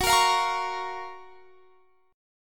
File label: F sharp Major 7th, guitar